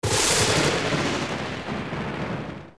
Comb Die; OOT

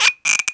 Bottle Close; Close a bottle

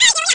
Attack

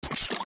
Steps Lava Jump; zelda; OOT

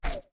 Zora Link Step; Zora Link walking (same sound in 3 pitches)

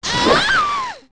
Gerudo Die

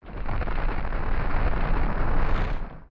Statue Crumble; Spirit Temple; zelda; OOT

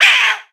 Bulblin Hit; A Bulblin getting hit; Eldin

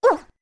Ledge Fall; Link falling off a ledge; Deku Link

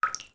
Beneath Well Droplet; zelda; OOT

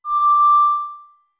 Ocarina D2 med; OOT

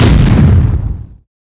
Axe Kick Explosion; Explosion from Ganondorf's axe kick

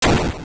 Dungeon Door Slam; A dungeon door slamming shut

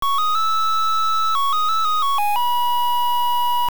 Ocarina Ballad

